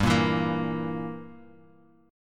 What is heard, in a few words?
E Diminished G